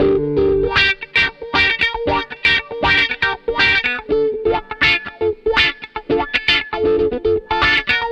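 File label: DISCO guitar WAH, 118 BPM, D; Drumdrops; Compact Disco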